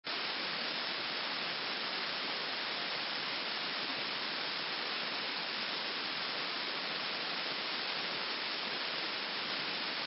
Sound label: White Noise